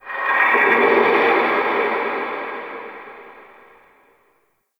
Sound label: Antique Rattle Distant; Ambience; Dungeons; Under City